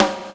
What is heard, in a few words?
SD W FX, SNARES; E-Lab; X Static Goldmine 2